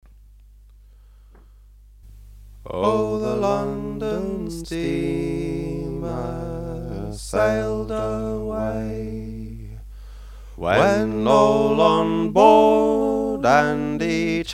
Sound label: London Steamer melody with tenor harmony sung; singing histories